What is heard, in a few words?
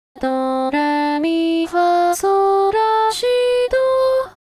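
key solfege Japanese; KITA; 高音キタ; serious + dark + soft; A mature and reserved style.; ballad, ambient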